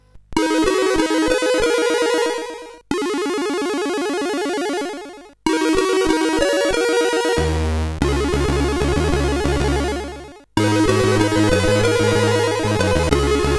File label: note sid CSG8580R5